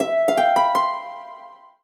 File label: Solo Harp Positive; MUSIC EFFECT; startide